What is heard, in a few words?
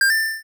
diamant; Princess Goldblade And The Dangerous Water